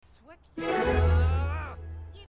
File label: first kiss; sims 4